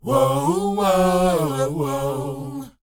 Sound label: WHOA C#, Vocal, R&B & Dance; ILIO; Vocal Planet